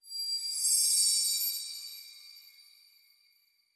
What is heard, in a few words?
state shimmer